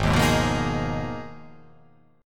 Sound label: G sharp Minor 6th Add 9th